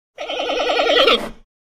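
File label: Monkey Titi Call Whine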